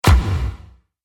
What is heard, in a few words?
Trailer Hit Sharp Impact, Trailer Sound Effects